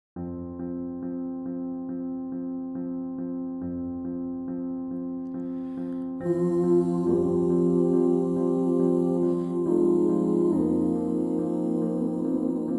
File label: TUTTI